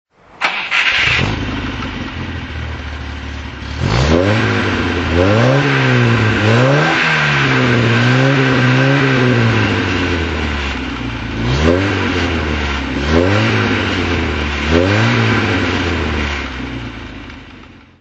volvo p1800 throttle; volvo p1800 jensen

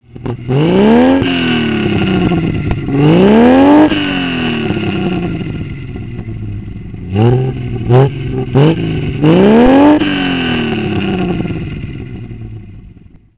tr6 weber

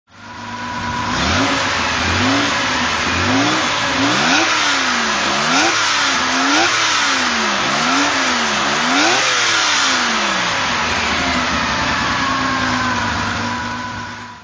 throt, Porsche 911S; 'soft window' Targa, 1967

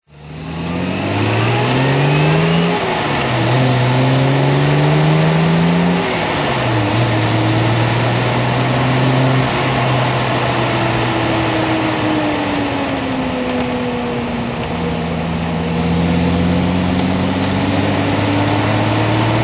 austin healey 3000 mk1 driving

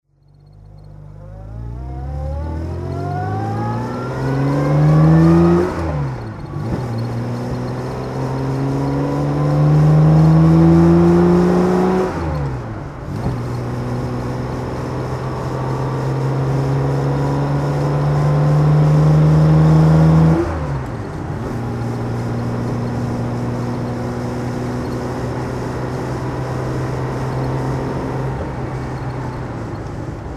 aston martin db24 driving

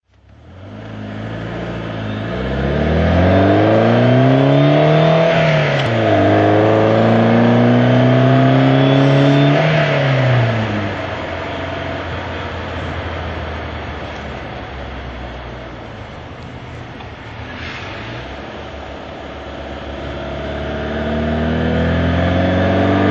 facel vega facellia f2 driving

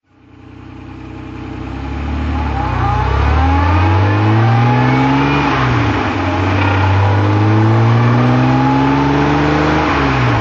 austin healey 3000 mk1 sidepipe drive

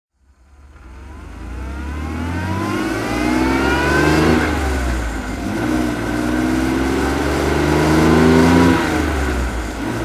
healey 3000 mk1 sidepipe drive

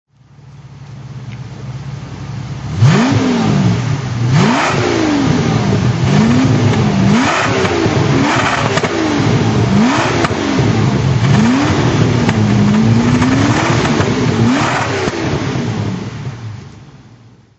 etype v12 conv throt

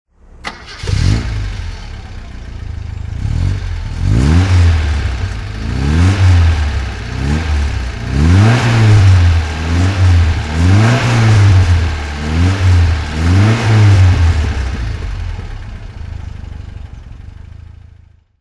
alfa giulietta 1300 sprint throttle

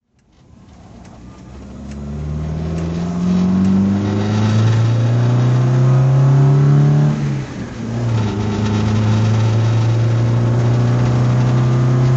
volvo 123 gt drive

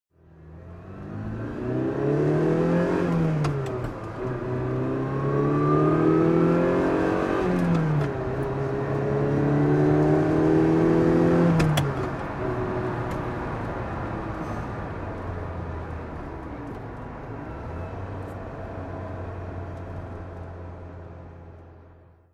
ferrari 328 gtb drive

